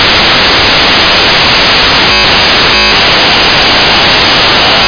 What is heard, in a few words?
TIME MACHINE ACTIVATE